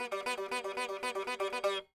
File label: sitar